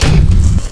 robot step; nagslang

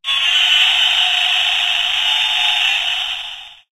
evil toy; nagslang

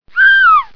scream kimono torn; geisha

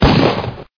fire rifle

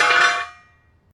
clang; pyntnclick

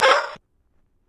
kill fox

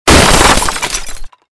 vase breaking

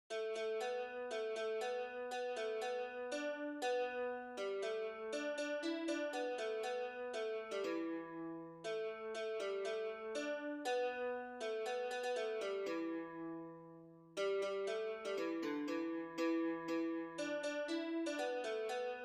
ambient japanese music

